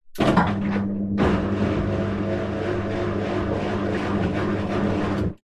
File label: household washing machine start on short sequence